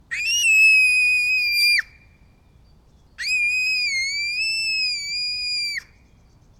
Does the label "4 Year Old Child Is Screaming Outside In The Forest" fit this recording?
Yes